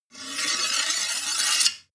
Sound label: sword blade scrape long